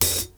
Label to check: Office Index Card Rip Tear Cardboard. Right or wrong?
Wrong